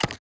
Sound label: ui click menu